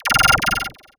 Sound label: Retro FX; Cymatics; Video Game Sample Pack Vol 1